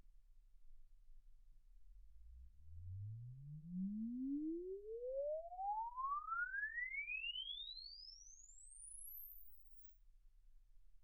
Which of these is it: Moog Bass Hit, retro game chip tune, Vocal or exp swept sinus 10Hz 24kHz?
exp swept sinus 10Hz 24kHz